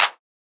Clap Snare

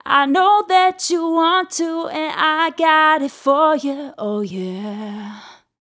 vocal hook know you want to dry 115 C-min

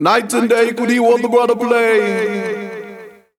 DUB VOX FX

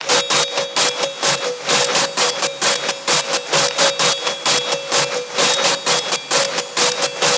Diffused Rips, 130

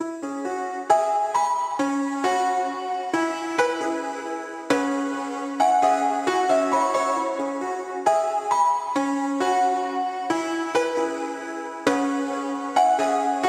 Melody Loop Piano Em 67 BPM LoFi Ambient

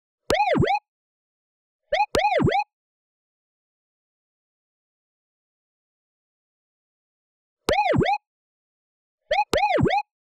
FX Siren

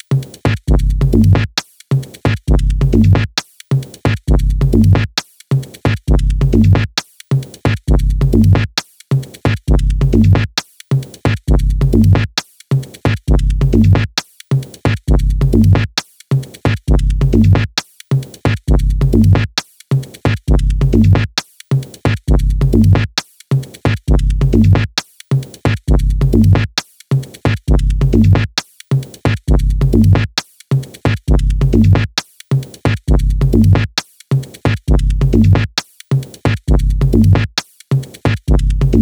NO KICK; Locked Grooves; Wubba

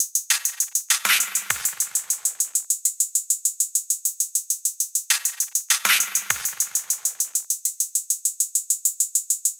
Top Drumloop 100BPM

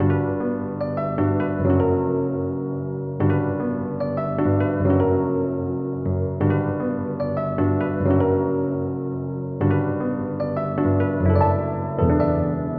vintage bliss 150 B

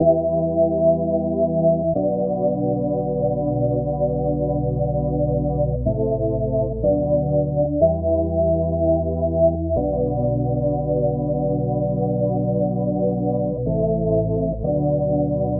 Pad Variation 123 G Wet

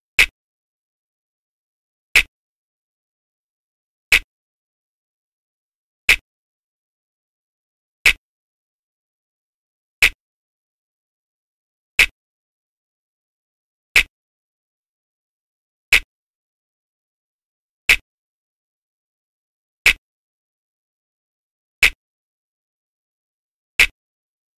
B snap

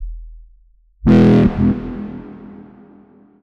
Bass E Phrase

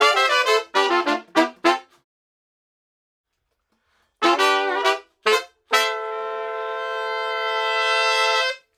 99 Dmin Horns

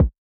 Kick

